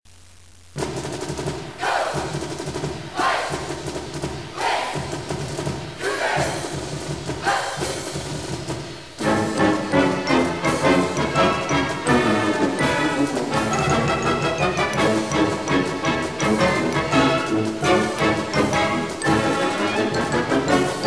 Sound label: Washington State University WSU fight song